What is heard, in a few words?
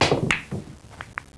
Break; Working Model Program